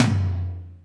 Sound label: Tom Smack Lo